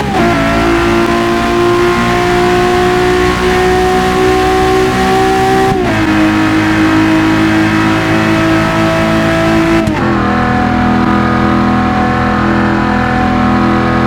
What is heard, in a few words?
fourth cruise, vehicles